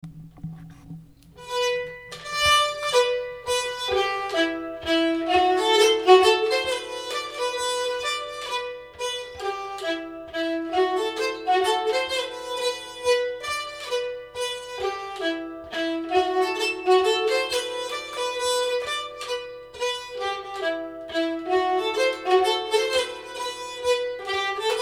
mazurkastämma; Syversens

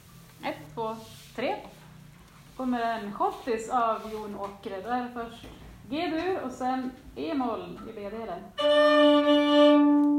schottis; Jon Aakre

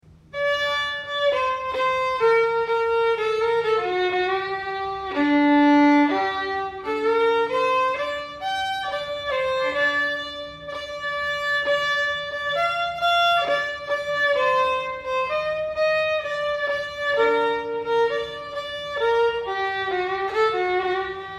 polska övningstempo; Finn Pal

